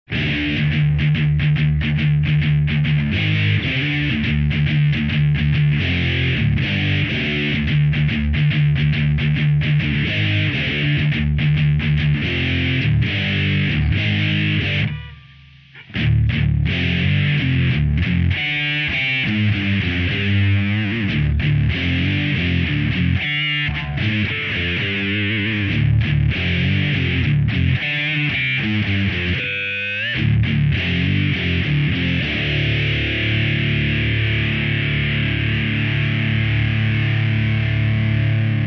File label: Metal